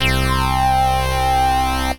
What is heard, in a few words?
Lead, Synth Lead